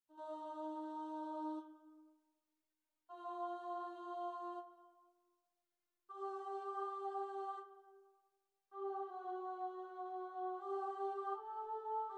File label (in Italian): coro contralti; Idomeneo